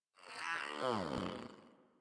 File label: Kitten Yawn; Enemies; Warframe; Catbrow